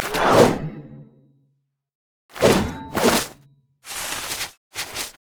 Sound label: recall lead in; Diana